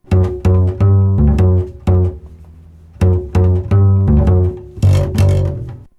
fret buzz

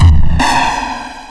drumz

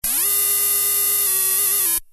WASP RIFF, SYNTHS

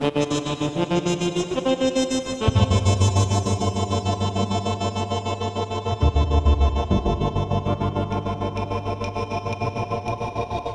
tenor

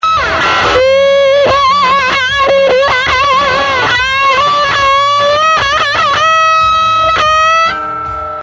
FUZZ WAH, GUITARS

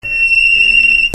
GUITARS

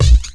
beat, drums

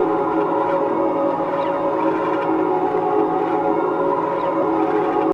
swirly thing

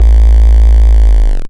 STOMPER

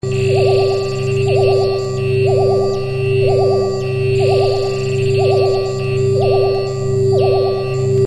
SCIF2SYN, SYNTHS

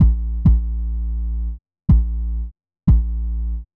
drums

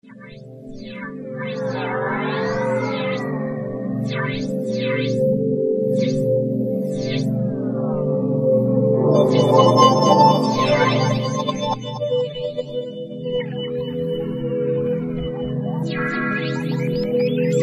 trip pad

